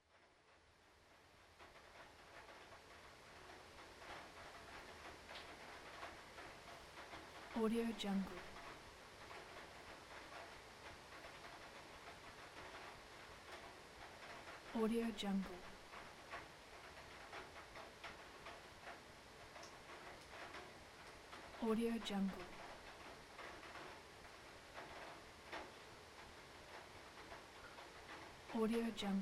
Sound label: heavy rain